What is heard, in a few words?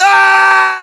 death fall; english; voiceovers; falls